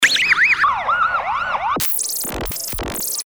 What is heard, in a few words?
Noizzer; Best Of N2O Library; Breakers & Transitioners